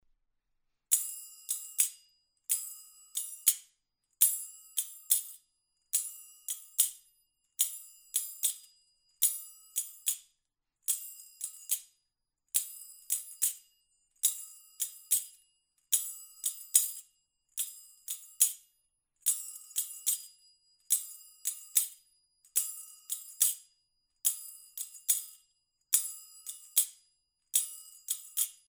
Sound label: finger cymbal